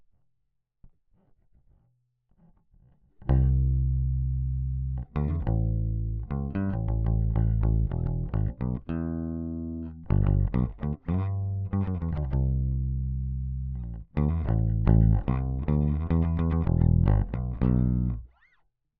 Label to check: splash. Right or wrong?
Wrong